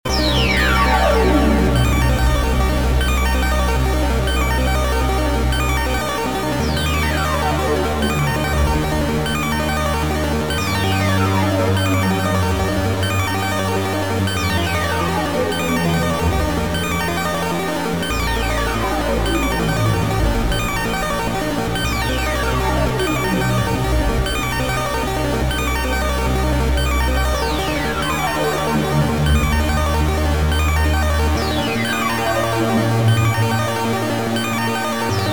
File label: Single Synth Loop, Soft